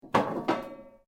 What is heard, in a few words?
metal rods move; Sound Effects:Materials; Foundation3